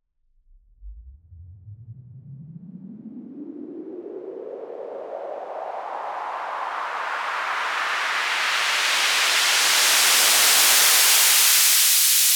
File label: Raise Clean